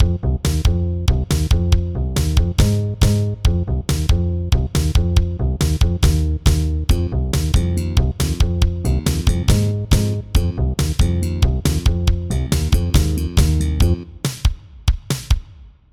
Funk; Wolfenstein 3D

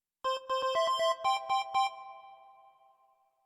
Arcade Victory, MT-32; Wing Commander I

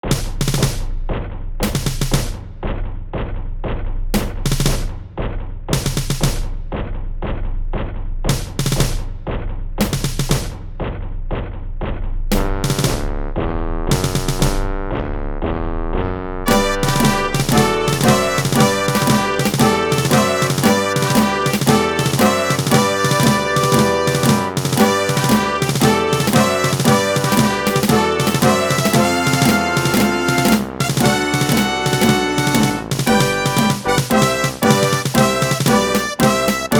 Victory March; Wolfenstein 3D

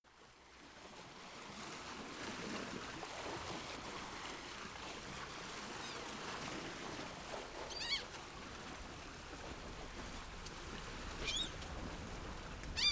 Docks Ambience; Secret of Monkey Island, The; Melee

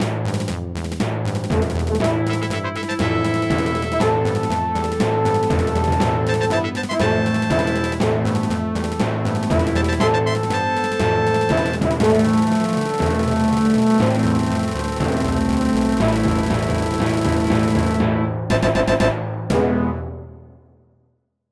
End of Level; Wolfenstein 3D

